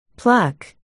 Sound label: pluck